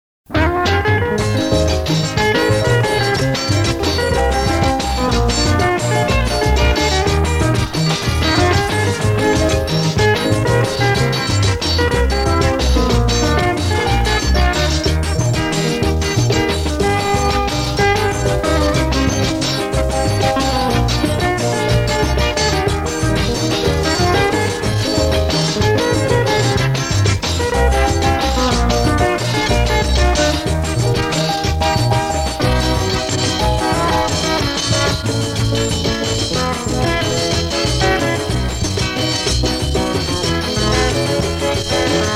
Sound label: Orchestral